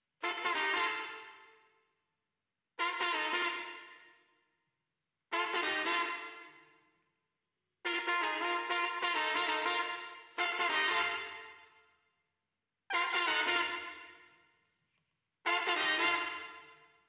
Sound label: Trumpets